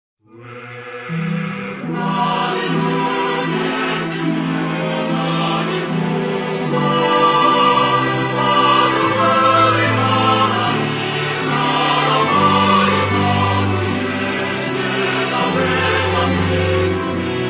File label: symphonic poem; drakengard 2